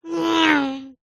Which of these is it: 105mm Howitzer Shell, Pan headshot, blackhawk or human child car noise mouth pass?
human child car noise mouth pass